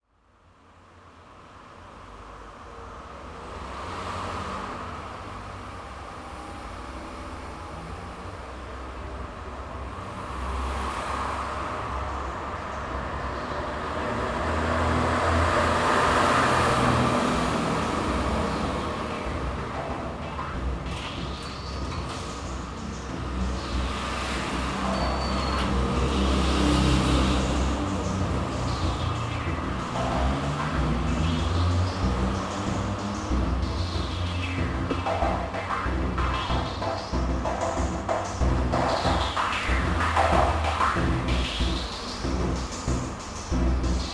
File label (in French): trafic tonalite sequenceur; soundscape